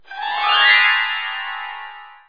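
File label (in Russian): арфа тревожное арпеджио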